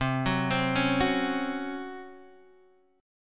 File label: Db Major 7th Suspended 4th C